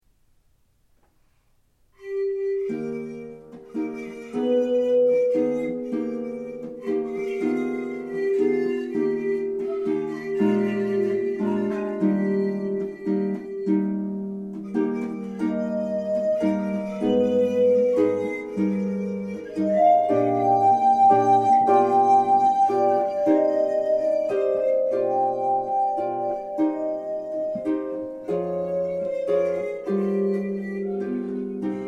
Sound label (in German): Harfe